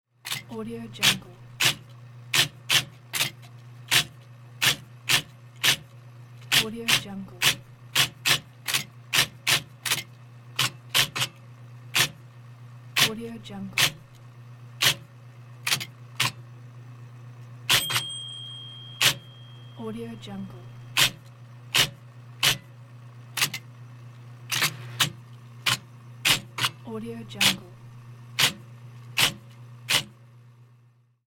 typewriting slow

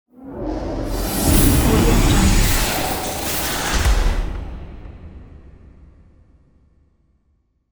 big machine

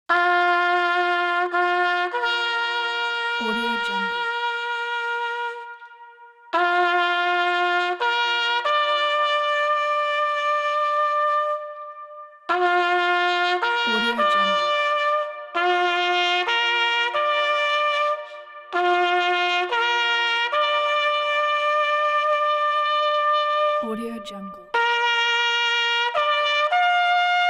solo trumpet taps

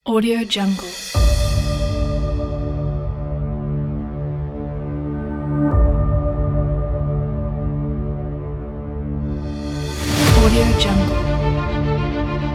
trailer epic

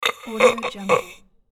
male dying voice

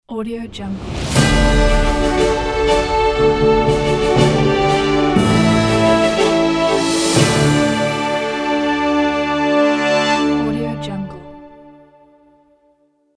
awards victory logo

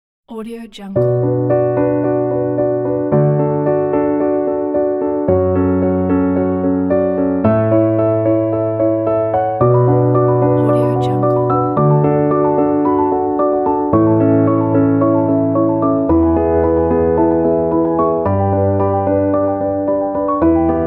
inspiring cinematic emotional piano

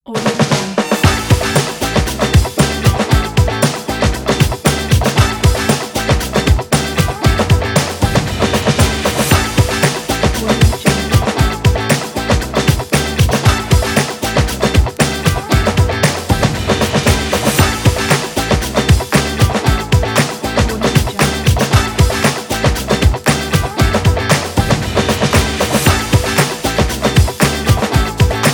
funk rock song

